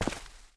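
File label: Normal Man Walk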